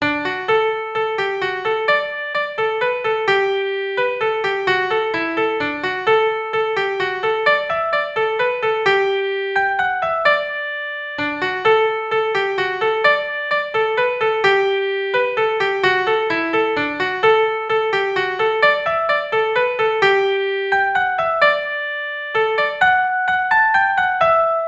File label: mazurka